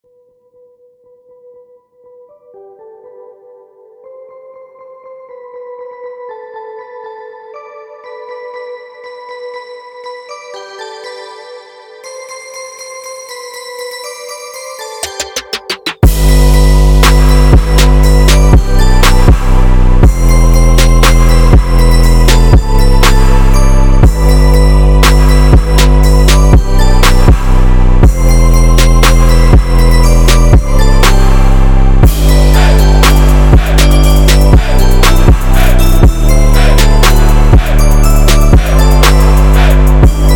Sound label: Jingle Bells Trap Remix